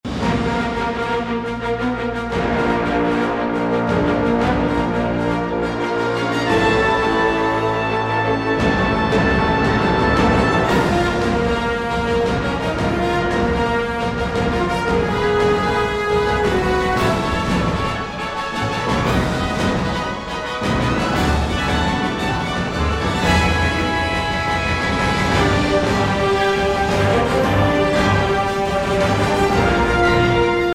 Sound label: Spark Action 172BPM; Mixology Vol 1